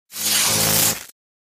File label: Elect Arc Shrt Circ, arcs & sparks